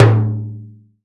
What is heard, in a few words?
CNTR STRK, Timbale; Timbale Battery